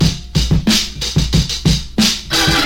91 bpm drum loop A key, Free drum beat - kick tuned to the A note. Loudest frequency: 2375Hz